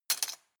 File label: cutlery plastic drawer place hard